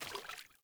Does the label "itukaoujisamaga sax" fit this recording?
No